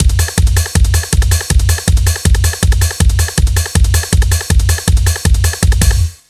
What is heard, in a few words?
intro loop